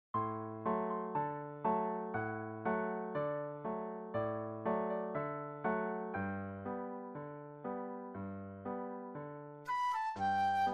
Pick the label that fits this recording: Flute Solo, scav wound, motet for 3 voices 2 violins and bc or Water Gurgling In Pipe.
Flute Solo